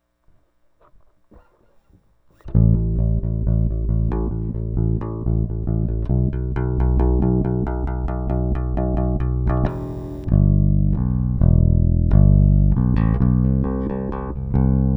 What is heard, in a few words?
bass